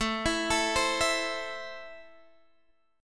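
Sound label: A Minor, Guitar Half Step Down, chord strum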